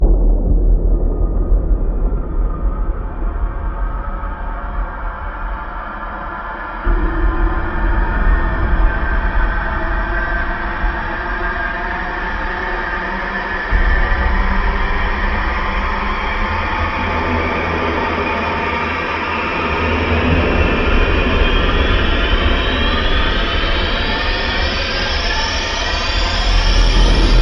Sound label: Rise Long